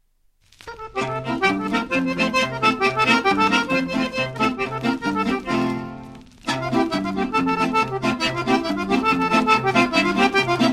Ballo Sardo - Italie

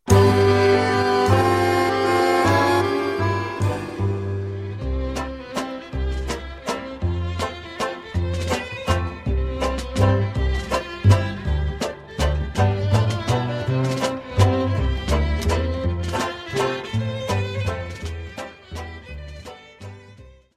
Valse Traditionnelle - Canada

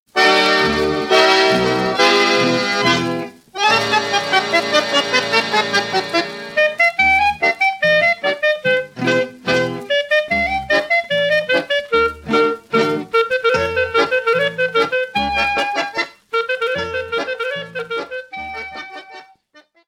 Danza - Italie

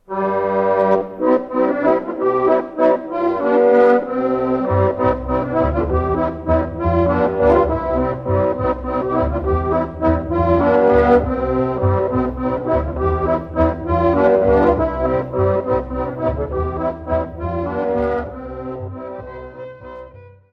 Passe-pied, Belgique; Erezee

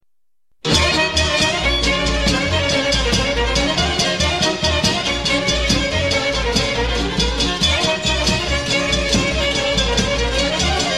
Batuta, Roumanie

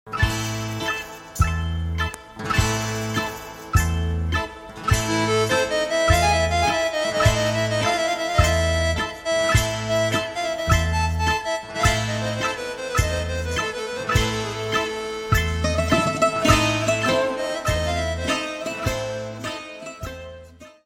HASSAPIKO - Grece

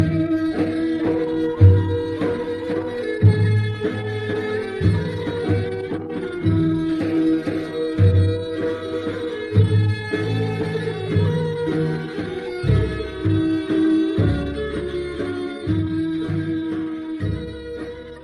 horo - Bulgarie

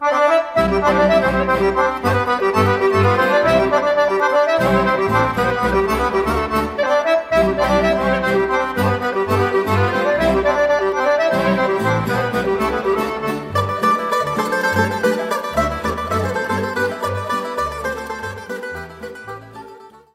Horo - Bulgarie; Winding Horo

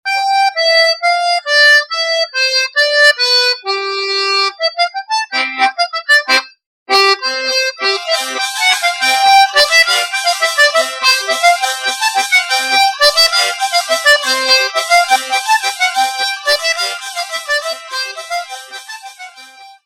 Bourree, france auvergne

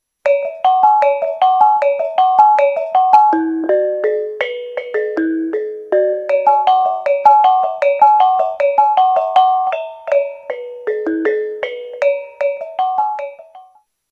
instruments bonang

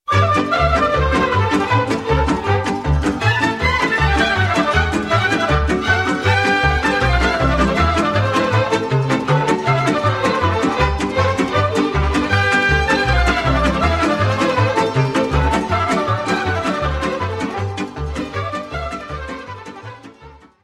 BATUTA TARANEASCA, Roumanie

